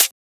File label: Closed Hat